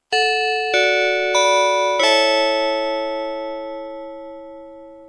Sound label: chime, railways